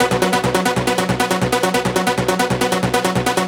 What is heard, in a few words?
Sequence Fill In 138 BPM, Melody & Synth; Bminor